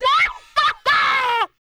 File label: LAUGH, Jazz FX; LAUGHS; Spectrasonics Vocal Planet